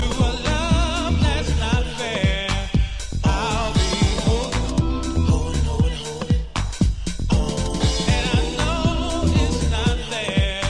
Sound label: BIGBEAT